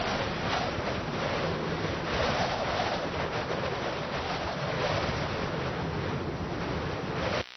wind tree, ambience

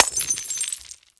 glass

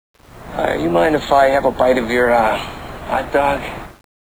bite

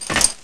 player metal

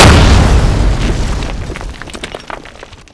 explode

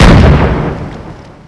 cannon fire

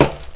player ladder

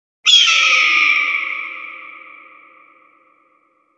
hawk

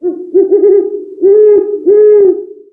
owl

